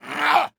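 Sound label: voice charge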